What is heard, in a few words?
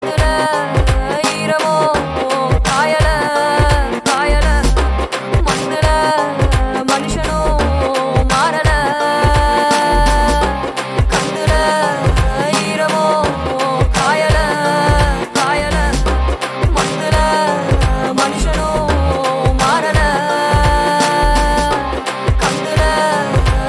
Parai Indie Parai BGM Ringtone